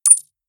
suoneria botton; beep

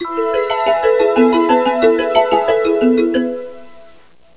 musica newage

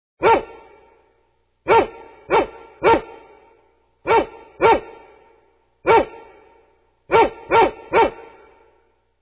cani dog; animali

